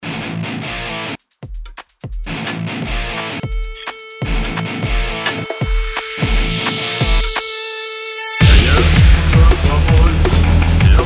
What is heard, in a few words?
drumnbase hard style remix